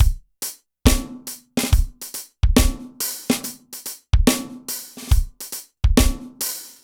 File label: Slow Beat, Drums & Percussion, Electronica; Sonic Foundry (Sony Creative Software) - Electronic Point Blank